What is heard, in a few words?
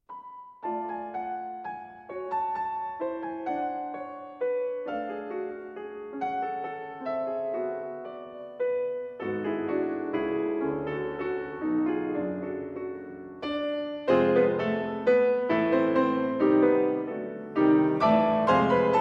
Prelude in D Major For Piano